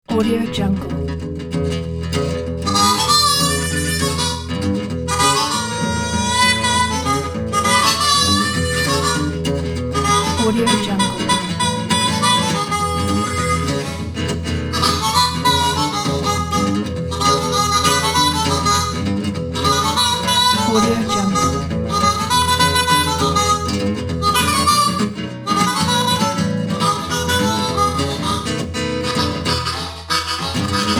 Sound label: acoustic harmonica boogie